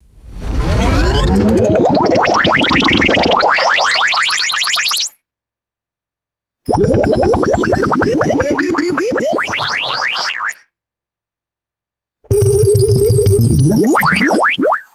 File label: cartoon synth rising power up sounds